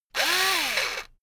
electric drill screw in medium; maschinen; bohrer; soundpool

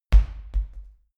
medicine ball bounce, fitnessstudio; sport freizeit

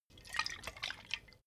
bottle vodka shake single; berufe

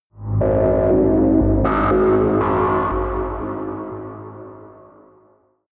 sci-fi cosmic dark energy, horror

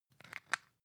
floss close compartment soft; haus-garten; badezimmer

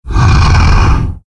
danger growl; kreaturen

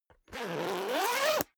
zipper down jacket open accelerate; objekte; reissverschluss

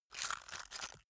stone scrape; natur-tiere; steine

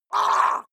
water monster female gargle pain short; comedy-cartoon; soundpool

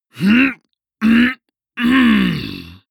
male harrumph angry; menschen; koerpergeraeusche; soundpool

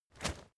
step leaves wet run heavy single; schritte

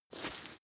step grass step; schritte; menschen

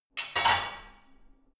barbell only replace hard; sport freizeit; fitnessstudio

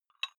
dumbbell take off very small weight gentle; sport freizeit; fitnessstudio

